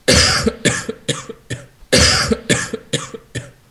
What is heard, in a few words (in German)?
Reizhusten